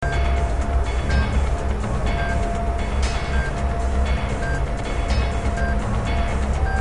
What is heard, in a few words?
RWR ton; f22; Radar uyarı alıcısının sesini